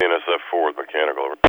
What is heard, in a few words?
Fort Worth Mechanical, BNSF